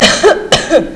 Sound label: Woman, People; Sound Library 2000; Wayzata Technology